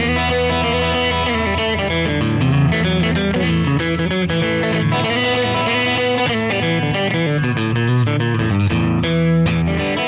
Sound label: Lead Boogie E